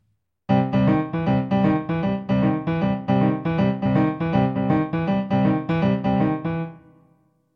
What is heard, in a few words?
Left hand variations, piano blues